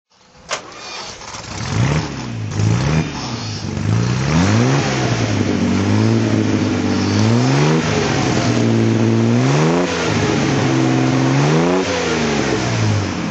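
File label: austin healey 3000 mk2 throttle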